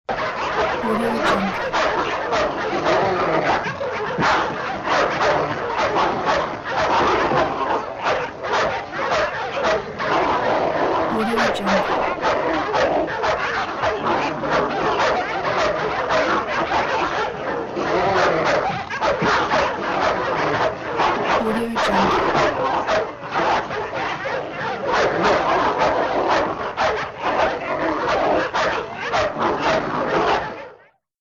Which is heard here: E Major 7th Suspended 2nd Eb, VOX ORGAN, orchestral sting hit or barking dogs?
barking dogs